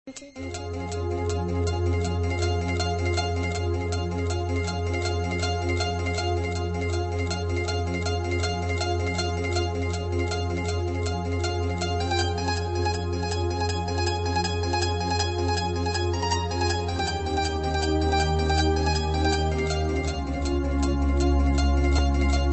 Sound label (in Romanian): muzica; horsey racing